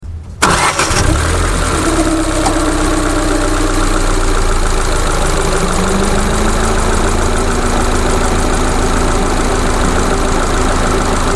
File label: Ford Fiesta Sport Ignition Air Cond Fan Outside